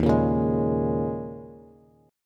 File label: D sharp 7th Suspended 4th, Bass; D#7sus4 strummed; JGuitar